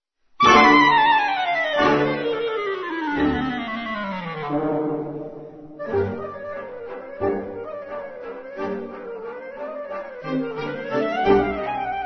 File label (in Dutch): flight bumblebee klarinet piano